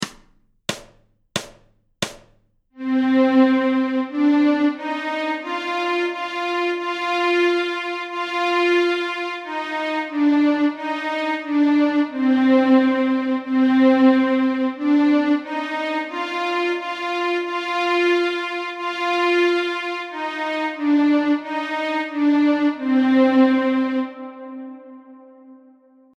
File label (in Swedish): Höstmåne Stråk Cmoll, Fiol